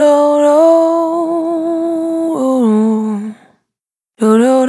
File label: Vapor Dry, Single Voice Melodic Loops; Pocket Diva World Class Vocal Samples